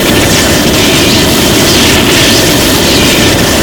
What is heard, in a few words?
flame thrower loop crit; tf2